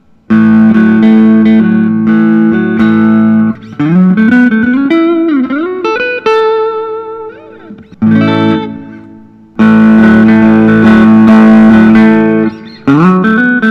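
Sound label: collings i 35 deluxe, guitar; iced tea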